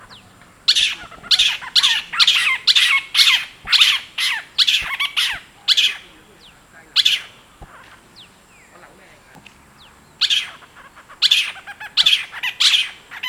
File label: Athene brama; Brahmaanse steenuil; uilen; Strigidae